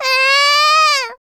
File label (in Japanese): ええーん, Voice Breath; Vocaloid 6.5.1; Otomachi; VOCALOID6 AI OtomachiUna Spicy